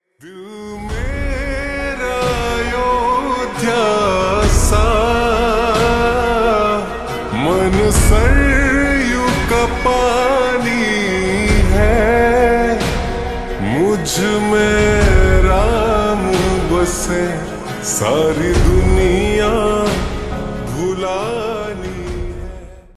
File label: Slowed Reverb Ringtone